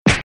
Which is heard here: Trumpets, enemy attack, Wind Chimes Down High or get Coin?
enemy attack